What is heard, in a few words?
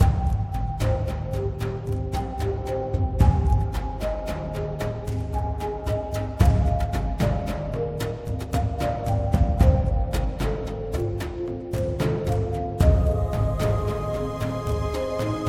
Heavy Loop; musicGameplay2-4